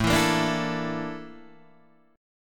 A sharp Major 7th Flat 5th, chord

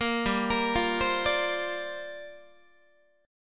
G Minor 11th G down Guitar; arpeggiated; 4A,4E,5A,5D,5G#,6C#; Gm11; 13,15,13,15,16,13

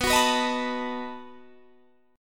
B 7th B down Guitar Standard

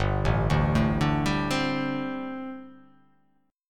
G Suspended 2nd Suspended 4th down; Gsus2sus4 arpeggiated; JGuitar

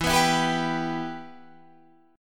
B Suspended 4th Sharp 5th, E, chord

